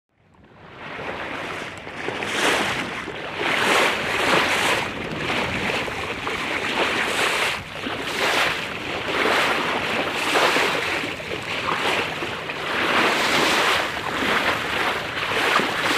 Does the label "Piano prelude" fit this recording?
No